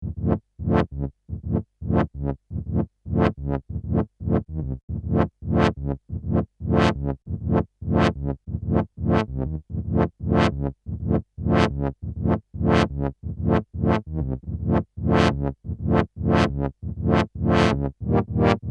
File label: Siel Orchestra Brass